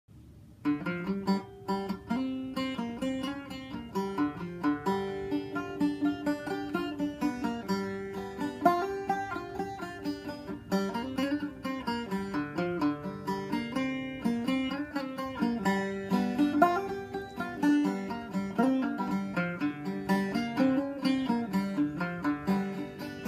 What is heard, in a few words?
warmup, banjo